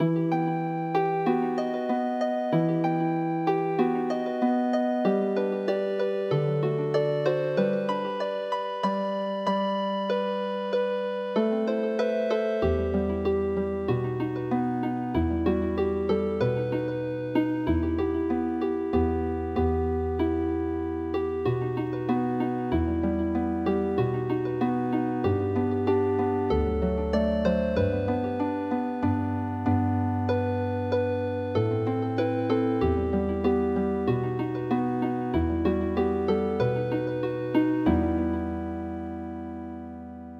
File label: Cossack Lullaby Healing Harp